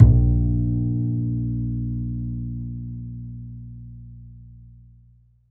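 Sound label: double bass A#1, Jazzistic; DBASS; Univers Sons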